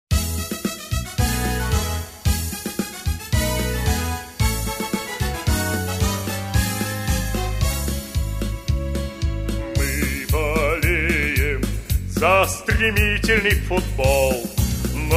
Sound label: hymn; torpedo voljsky